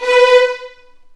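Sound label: B4 violins; The Ultimate String Collection; East West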